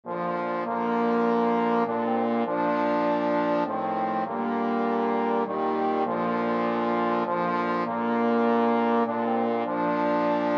trombone quartet

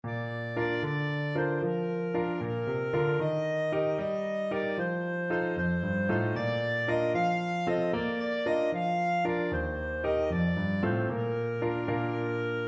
oats peas, clarinet

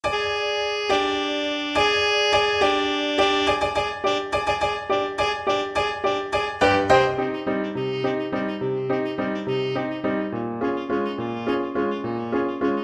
Danse Mac Saint S alto saxophone

